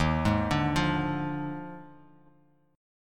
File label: D sharp Major 7th Suspended 2nd Suspended 4th, down, chord; arpeggiated; JGuitar